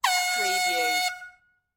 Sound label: Fog Horn, Boat, Long